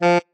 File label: marimba bat; ゆめ2っき